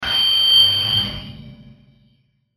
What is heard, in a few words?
Sweeper High Pitch Whoosh To Glitch Reverb; Blastwave; Production Element Imaging Element Accent Transition